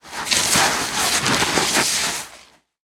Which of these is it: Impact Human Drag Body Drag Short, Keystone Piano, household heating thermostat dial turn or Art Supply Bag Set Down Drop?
Impact Human Drag Body Drag Short